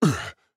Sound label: Movement Land